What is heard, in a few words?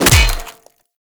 Melee Hammer Impact Flesh; Hammers